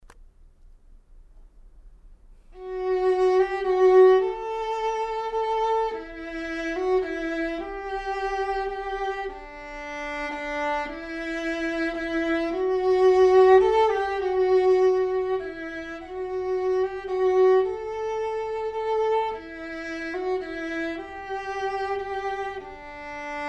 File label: Andante Grazioso Vla; melbay; Mozart